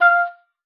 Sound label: OBOE2, F5; Xsample VOL-6